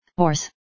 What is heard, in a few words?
horse, farm animals